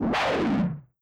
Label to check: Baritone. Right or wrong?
Wrong